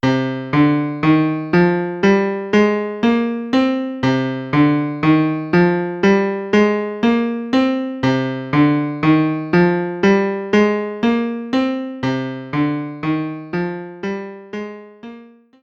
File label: scale; Sonic Pi